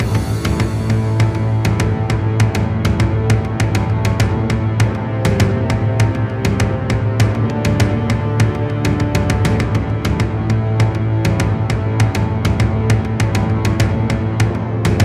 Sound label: Epic Cinematic Trailer Music